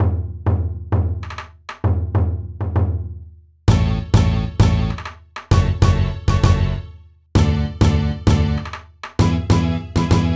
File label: J-Ballad Stage (Karaoke); Pinky Street Kira Kira Music Hour Pop Princess; J-Ballad Stage 2